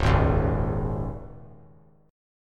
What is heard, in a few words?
Db Suspended 2nd 3C,3F,3A,3D; strummed; Dbsus2; JGuitar